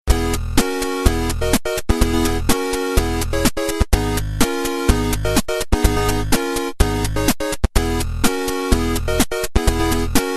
8 bit, ringtones

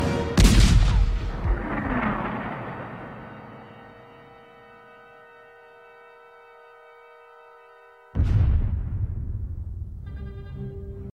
bomb test

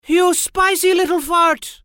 Spicy little fart

